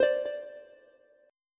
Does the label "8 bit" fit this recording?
No